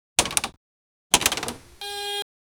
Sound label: sound of close cartoon, animation